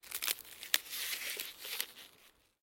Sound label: Ice crackles; SyrinScape SoundsScape Collection; bbh Haunting part 1; TTRPG